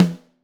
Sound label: SN Top, Snare